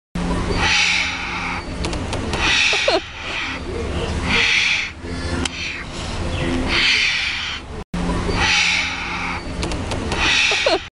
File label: Wombats; وامبت